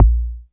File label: MINIM BD; DANCE KIT